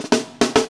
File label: Fills; AMG; Now CD-ROM (Roland)